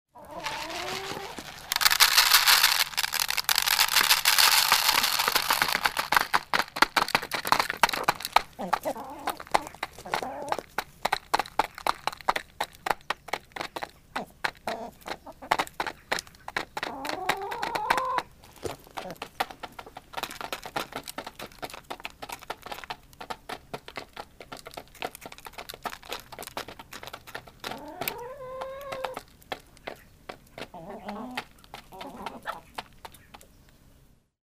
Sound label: tipping grain into metal dish to be eaten by hungry chickens on cold day